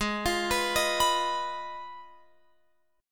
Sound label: Ab Minor 6th Ab, down, Guitar, Open F; Abm6; arpeggiated